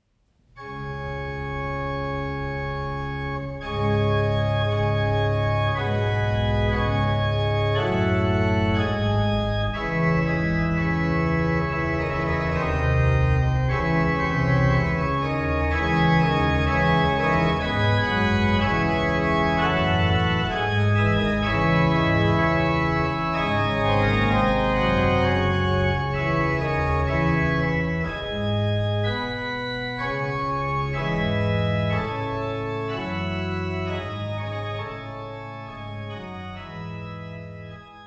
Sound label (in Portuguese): Orgao Arouca; PureSound